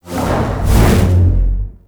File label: Arm Lift; The New War; Lua War Room Part Two